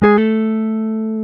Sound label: Jazz Guitar